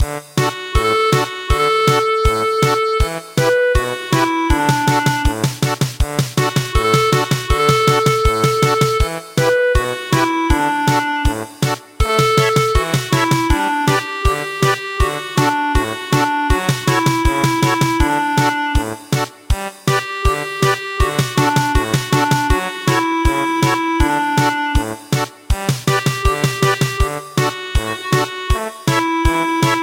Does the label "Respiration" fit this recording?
No